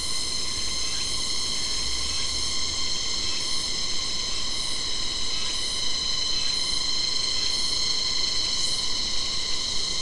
mainly insect cluster

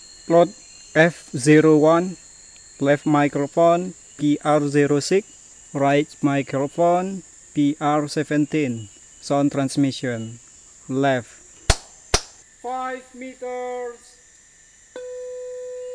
birds

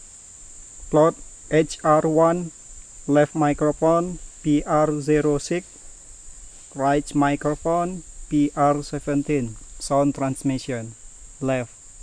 birds

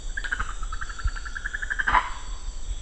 Pithecia, Pithecia monachus

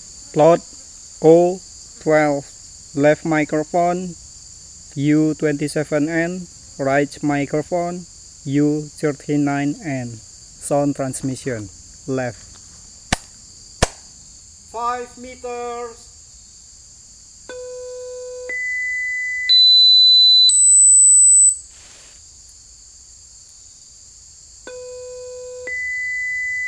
birds

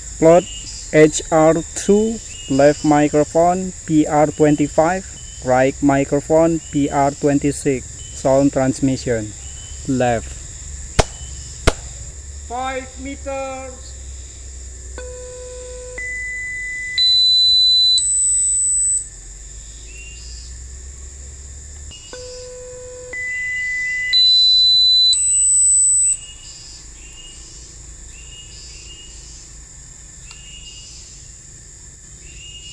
birds

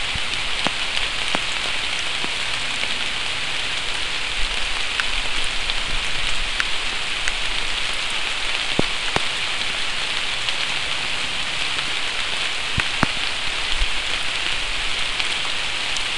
mainly rain

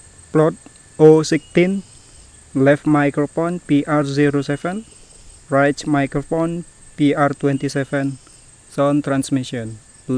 birds

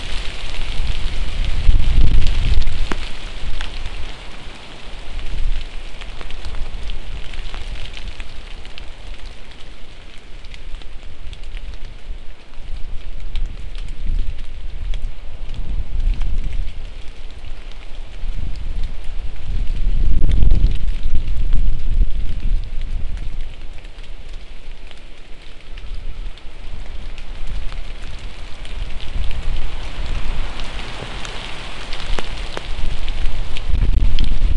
mainly rain

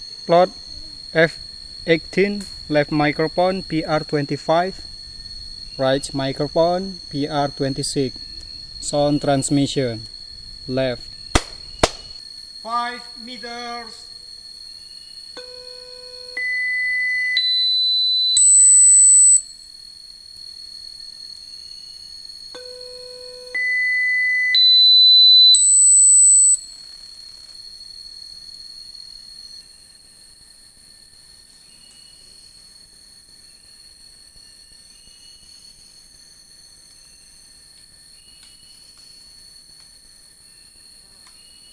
birds

